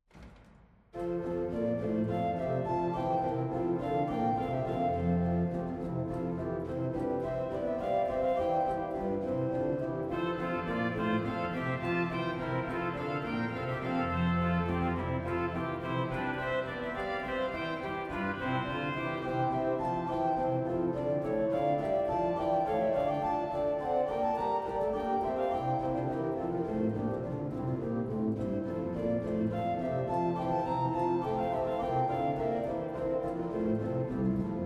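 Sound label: Bouree in F Major, organ; Handel; Caen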